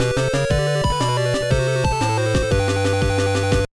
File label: Boxing - Win; Game & Watch Gallery 4; Game & Watch Gallery Advance; Modern Mode